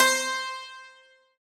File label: Santoor C4